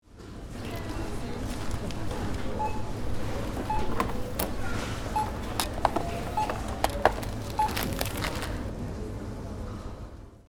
Supermarket checkout ambience beeping scanning products